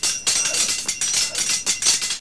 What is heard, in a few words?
SWORDS